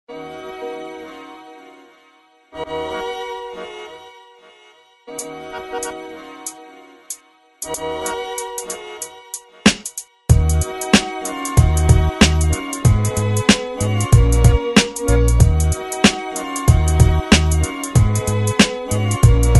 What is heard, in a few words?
Beats